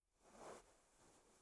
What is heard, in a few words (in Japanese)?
布ズレ